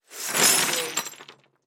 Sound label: Debris Ceramic Glass